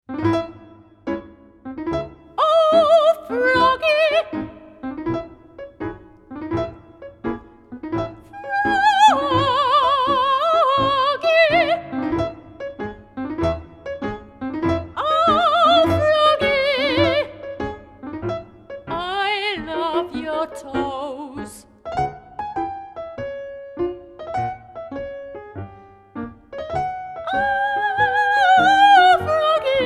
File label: Improvisation